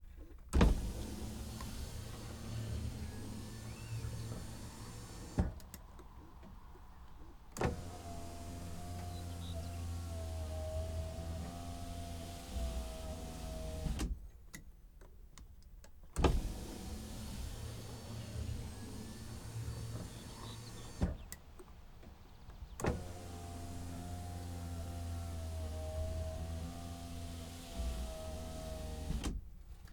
man lions coach window open and close; MAN Lion's Coach 2015; Pole Position

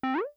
phaser up; Kenney's Sound Pack; Digital Audio

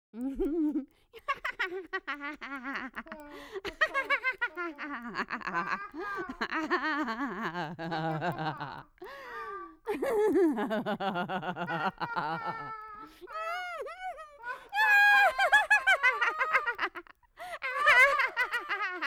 Horror, Cackling Hags; BBC Sound Effects Library

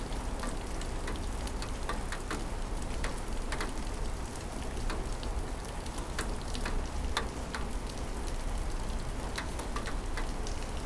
rain window; 99Sounds; Rain And Thunder

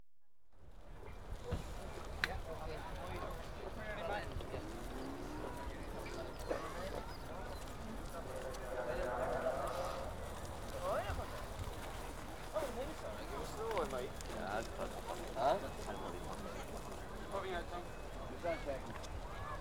Racing, National Hunt, Atmosphere; Equestrian Events; BBC Sound Effects Library

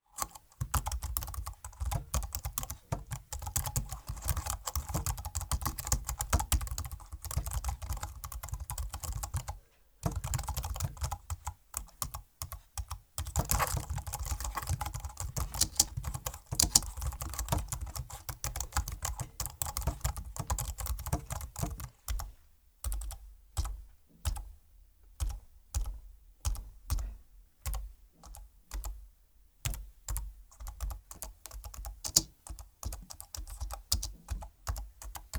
Typing Keyboard Variation; Office; Wav Junction Sound Effects

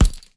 Punching Percussion, Straight Glove (Chain), raw; 99Sounds

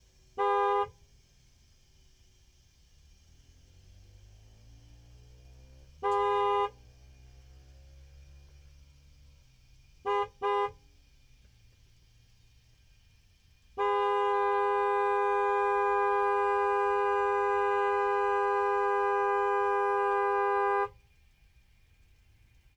Nissan 370Z, Horn, Various, Exhaust, Left; 2012; DPA4062

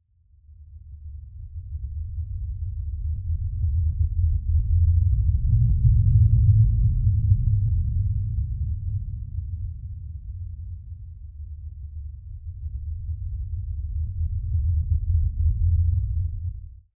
Atmospheric; 99Sounds; The Weird Side Samples

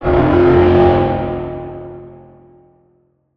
Braams; Matias Vidal Saavedra; Filmmaker Ultimate Sounds

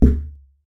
impact Punch heavy; Kenney's Sound Pack; Impact Sounds

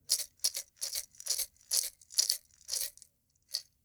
Condiments & Spices; TheWorkRoom Audio Post

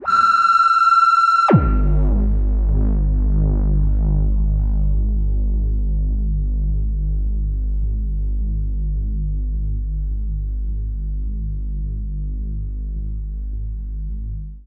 Feed detuned, Feedback FX; 99Sounds; Dark Engine

